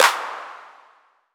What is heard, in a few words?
CLAP